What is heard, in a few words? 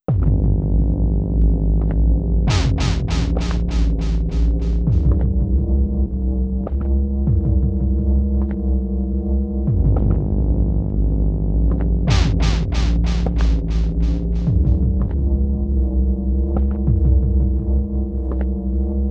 Approaching Footsteps; Detective Club; The Girl Who Stands Behind